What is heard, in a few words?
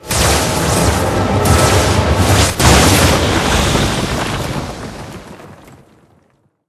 Wall destruction; Level16